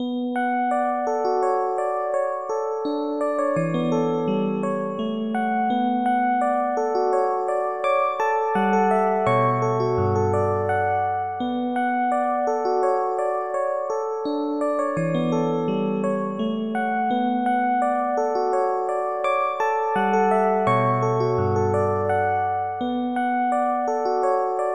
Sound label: mel